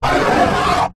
foley plastic smooth hollow sharp object scratch; zapsplat

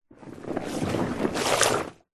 soapy foam bubbles bath water movement swirl hand

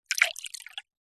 food drink spirit or water pour into shot glass

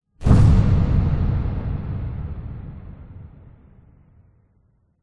explosion big powerful internal; zapsplat

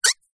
leisure toy squeaky squeak hard broken

